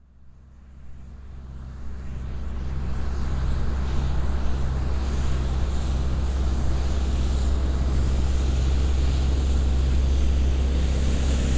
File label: Industry Street Sweeper Driving Pass